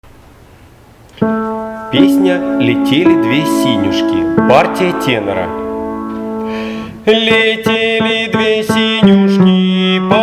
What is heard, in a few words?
Leteli dve siniushki Tenor